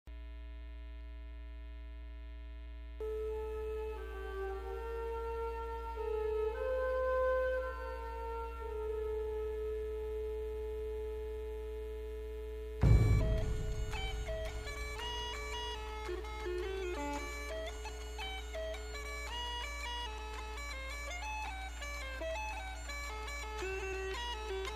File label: Celtic bagpipes Motivational Bag Pipes; Braveheart